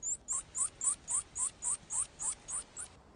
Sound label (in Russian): pishuha, zvuk pishuhi mlekopitayushie